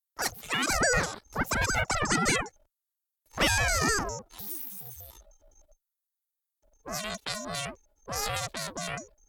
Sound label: Radio Droid Transmissions